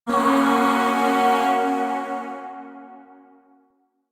Shawzin Hirajoshi Chord H; Chords; Warframe; Zariman; Void

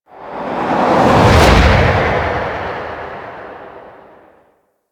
Fortress Asteroid Whoosh

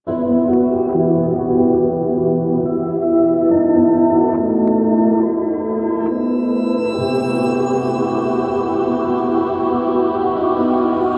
Quest Outro, Music; Warframe; Zariman Quest

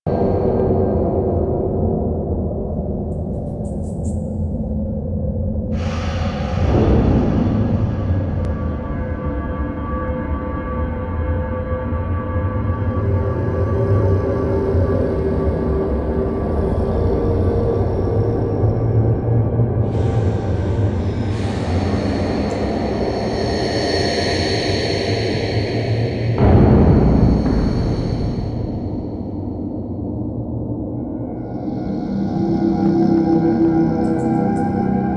Interior Background Amb Loop D, Ambience; Warframe; Duviri

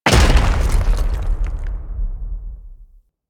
Thumper Land Heavy A; Warframe; Grineer

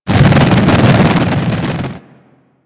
Jet Polearm Flame Charge, weapons; Grineer

